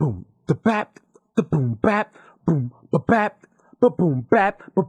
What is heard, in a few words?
not really beatbox, Drum loops